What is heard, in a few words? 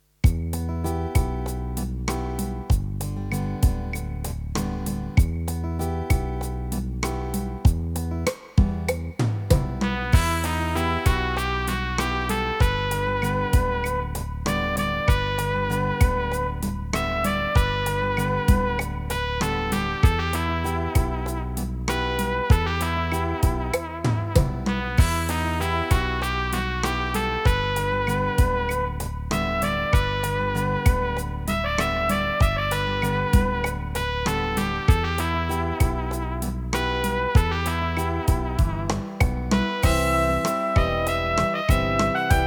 el condor pasa peruvian folk song bossa nova style